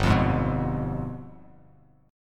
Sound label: G 7th Flat 9th G down, Bass Standard; strummed; JGuitar